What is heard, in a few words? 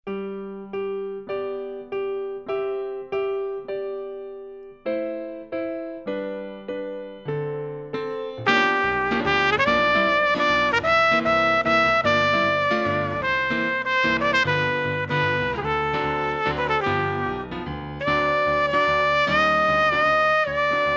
Playing Father Twinkle Twinkle